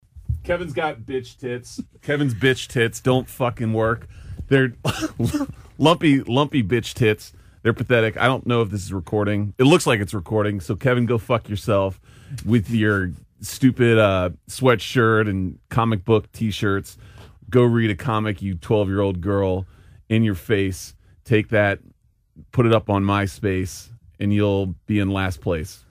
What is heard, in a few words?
rap